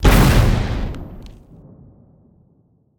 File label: small explosion; factorio 2.0.55